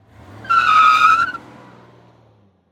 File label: Truck Tires Screech; Blastwave FX